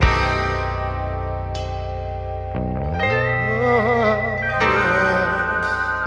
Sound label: keys and voice "woah oh yeah"; Loops; Sad